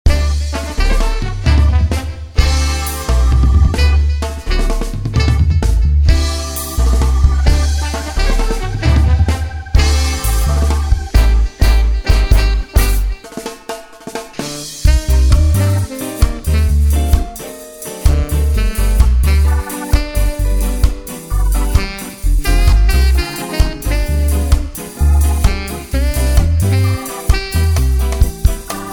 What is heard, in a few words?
Bossa Nova; USA